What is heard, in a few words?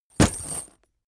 leather metal bodydrop